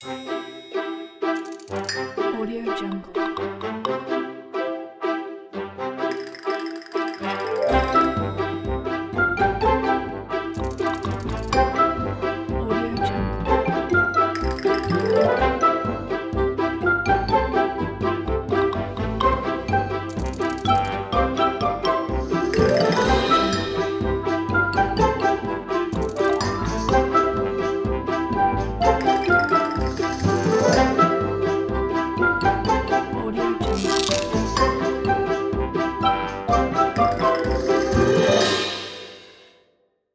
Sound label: cartoon